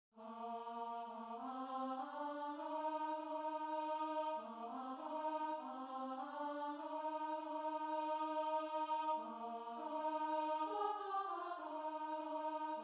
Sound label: Choir Alto